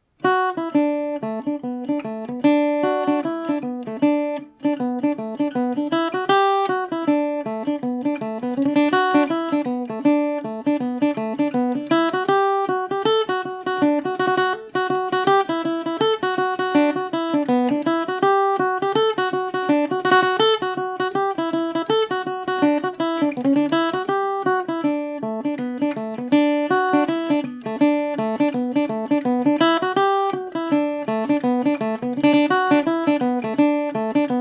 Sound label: Irish mandolin; humours of tulla revisited